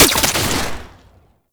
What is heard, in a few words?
Archgun Mech; Warframe; Weapons; Archwing; Tn Nokko Archgun